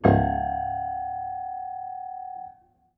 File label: Harm Low, Piano Harmonics